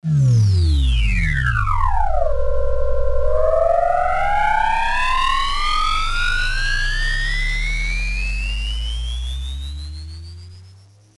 ufo

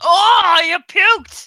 puked

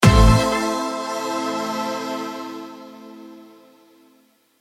sting

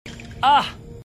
ah; denis brogniart

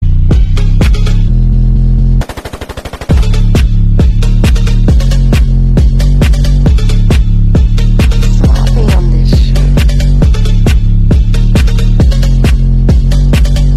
phonky trap phonk

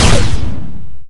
sniper rifle impulse shoot

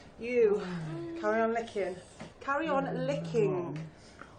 licking

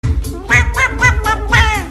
goblin bite

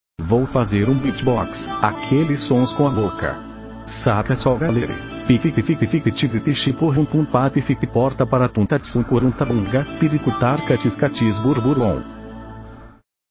beatbox

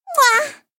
mwahh

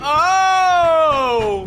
ooooooh

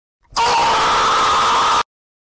guy screams; at green screen meme

